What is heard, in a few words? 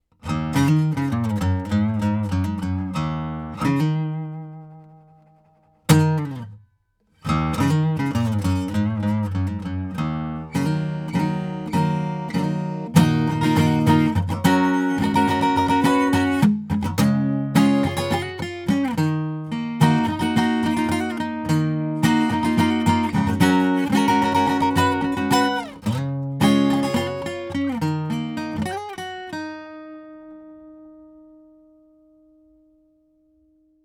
acgit sound samples tube dark; lct1040